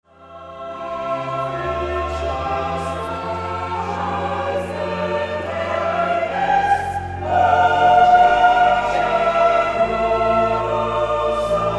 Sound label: concert